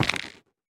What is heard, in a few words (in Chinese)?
菌柄 踩踏声